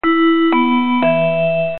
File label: gong D